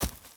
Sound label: dirt